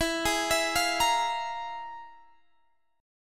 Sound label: F Minor Major 11th